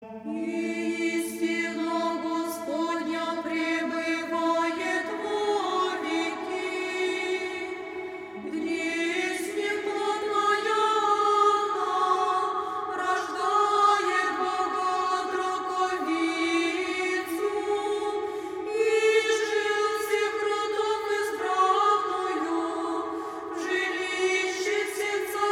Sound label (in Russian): Рожество Богородицы. Днесь неплодная Анна. 6 глас. На Господи возвах.; Стефана Святоградца